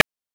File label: PITCHED NOISE; NES DPCM; MIDINES